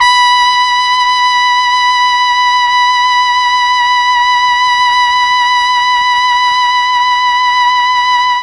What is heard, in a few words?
B3 trumpet, mellotron; CHMB TRUMPET